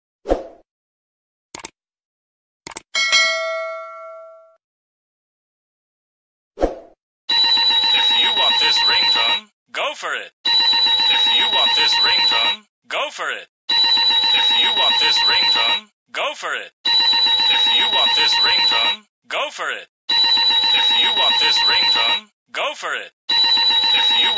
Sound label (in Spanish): SONIDO DE ALARMA FUERTE